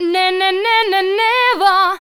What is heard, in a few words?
VOC F, Dance Vocals, FEMALE